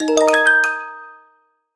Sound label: unlock Area; Piloteer